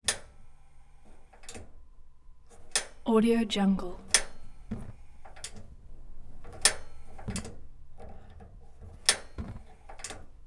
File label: stove clicking